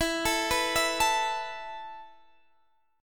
E Suspended 4th E down Guitar Drop D

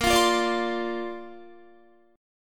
A sharp Major Add 9th, Guitar; A#add9; strummed; 17,20,17,19,17,20

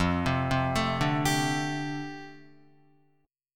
F 6th Add 9th F down Guitar, chord

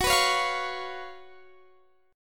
Gb Major 7th Suspended 4th Gb Guitar Standard; x,21,21,18,18,21; GbM7sus4 strummed; capo=2

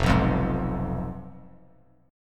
F Diminished, chord; Fdim strummed; JGuitar

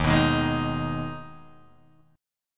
Eb Suspended 4th Sharp 5th, Guitar, Open G

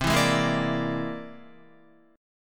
C Augmented 9th, Guitar; strummed; JGuitar

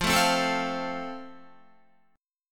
F Major, Guitar; strummed; chord

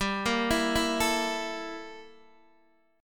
G Minor 6th Add 9th G down Guitar Open D

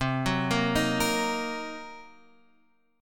C Minor 7th Flat 5th, chord; Cm7b5 arpeggiated; JGuitar

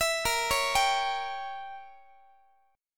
Bb Diminished 7th, Guitar; arpeggiated; x,x,19,20,17,18; 4A,4E,5A,5D,5G#,6C#; Bbdim7

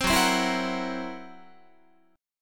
Gb 7th Flat 5th Gb Guitar; Listen to Gb7b5 strummed

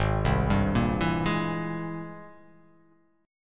G sharp Augmented Major 9th G sharp; G#+M9 arpeggiated; 4,3,5,3,4,3,x,x; JGuitar

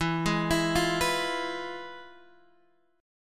F Minor Major 7th Flat 5th, E

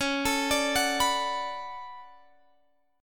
D Major 13th C sharp, chord

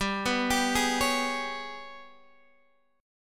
G sharp Minor Major 11th G

